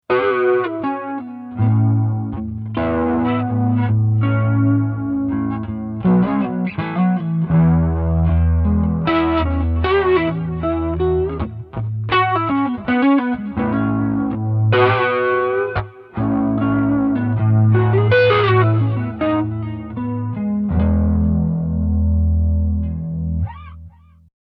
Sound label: slow blues gained